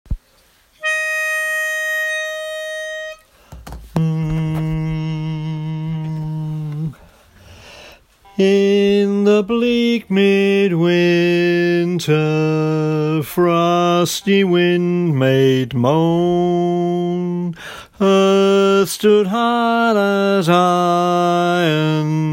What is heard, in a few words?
Lead